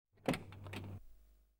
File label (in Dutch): Auto Deur open; KMP Film Video Sound Effects